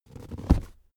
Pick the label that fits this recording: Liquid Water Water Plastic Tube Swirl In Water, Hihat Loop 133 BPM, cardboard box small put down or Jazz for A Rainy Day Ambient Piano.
cardboard box small put down